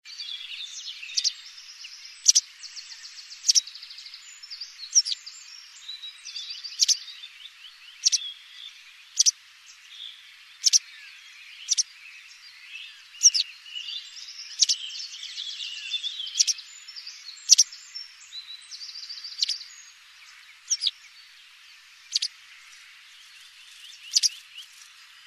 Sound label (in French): Bergeronnette grise